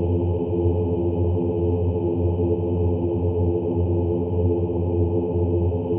InfiniVerb Voice, sparse soundscape Loops

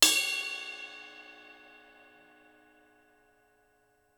Crash Bell, drums singles; The Roots Drumloops

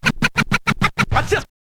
scratch short, Vinyl scratches

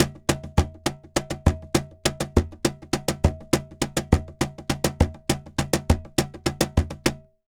Cajon 136; Best Service; Festa Latina VOL-1; Drums & Percussion

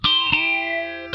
Funk Guitar; Zero G - Funk Guitar

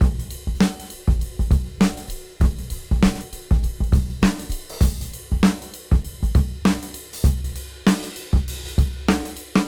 KL Beat 98 BPM Swing; AMG - Keith LeBlanc Phat Butt Jams

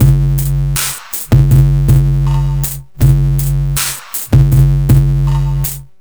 Structured Rhythm, IDM Rhythms

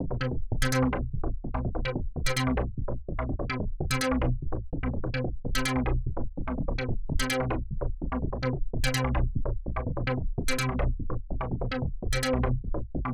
forced, Loops; Polyend; Loops n trouble

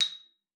XYP A#6, XYLOPHONE; Xsample VOL-4

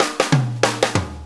Shuffle Fill, Drum Tools; Sonic Foundry (Sony Creative Software); Loops & Samples Drum Tools; Shuffles; Fills

